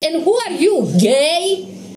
ringtone

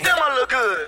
vocal

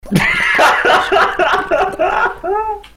laugh

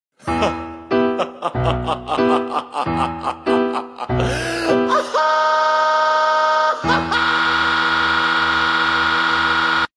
laugh glitch; burnham

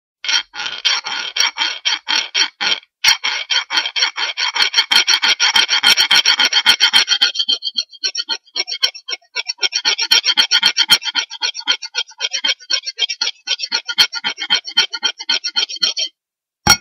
filing metal pipes sound

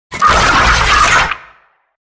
jumpscare; springtrap fnaf ar

